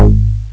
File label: rubber